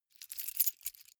key ring large rattle soft; objekte; schluessel

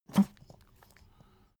pug gag smack medium; natur-tiere; hunde

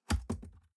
cardboard box open unfold bounce; objekte

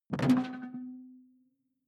sci-fi alien bounce detuned; swooshes-swishes

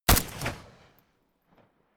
rifle ak-47 exterior fire single close; comedy-cartoon; Pistols and guns; pistolen-gewehre

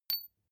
medium metal impact soft

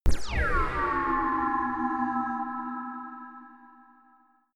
ringing laser beam shot; soundpool

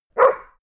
bark once, hunde; natur-tiere

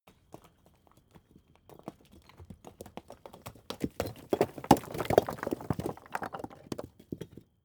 stone large fall long; natur-tiere; steine

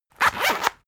zipper long open rapid; objekte; reissverschluss

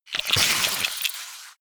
bug attack; whooshes rises; hits impacts

